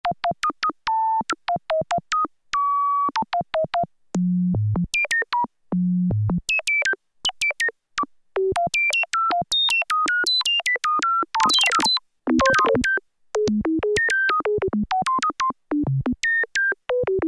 Sound Effect, Korg PolySix; Factory Presets Bank B

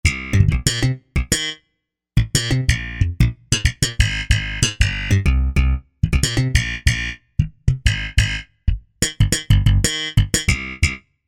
Slap Bass

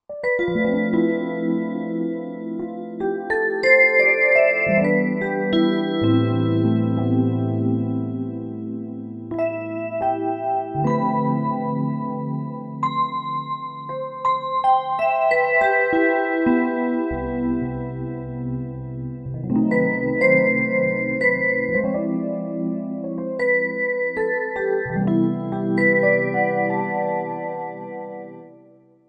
Rich Vibes, Roland SR-JV80-09 Session

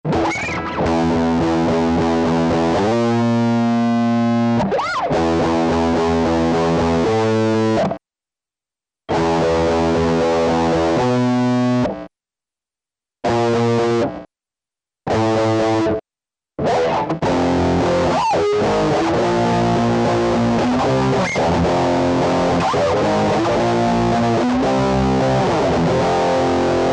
GAIN UP, Digitech RP200

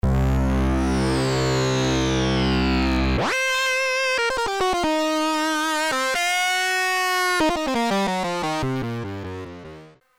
Tape Delay + Plate I; t.c. electronics M300; Engine 1 + 2

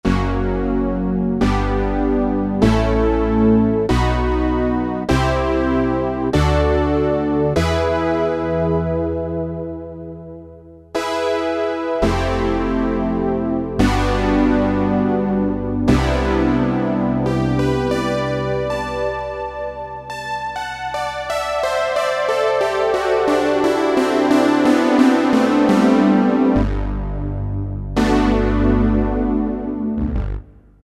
MEMORYMOOG 4

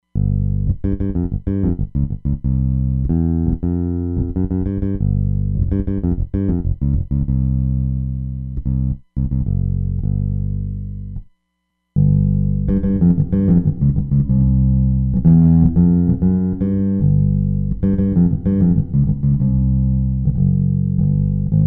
Bass, Roland DEP-5; Factory Patches

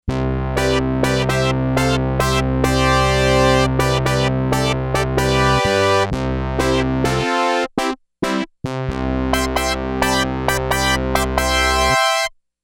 Sy. Brass

